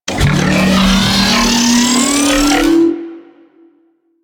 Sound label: Ice Wave Charge; Enemies; Abilities; Warframe; Corpus; Arachnoid Camper Terra